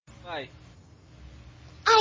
incoming from conference

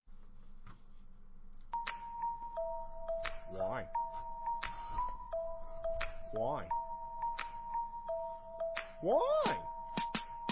rap; jeffy

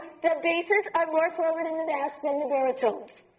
basses more forward

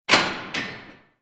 door prison

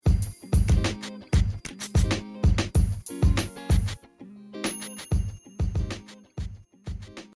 intro music

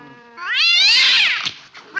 angry cat sound effect

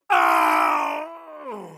k o scream; mk9 johnny cage

